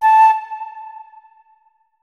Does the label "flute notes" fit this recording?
Yes